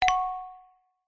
alert bell ping wooden

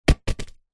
sport soccer ball flat light drop bounce concrete; zapsplat

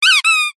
leisure toy squeaky

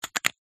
household nail polish lid close

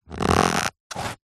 shoe sneaker pull stretch open; foley; zapsplat

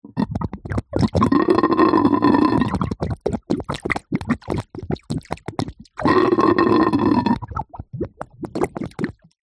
nature water gurgle light shallow bubble

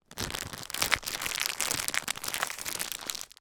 foley packaging wrapped item cellophane movements

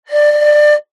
musical pipe metal blow over top whistle tuned

